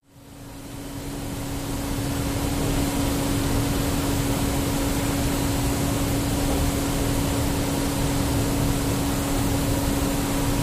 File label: Machine Blowers Ste, fans big